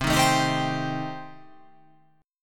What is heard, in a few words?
C Minor 6th C